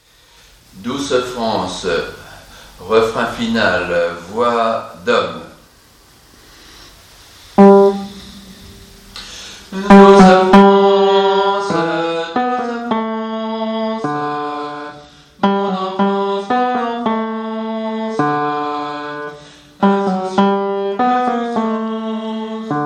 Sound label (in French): refrain final hommes